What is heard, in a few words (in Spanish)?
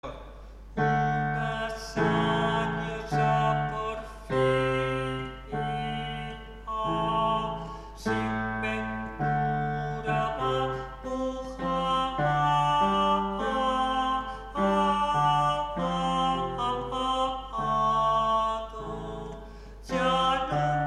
una sanhosa porfia ten; Una sañosa porfía; Juan de la Encina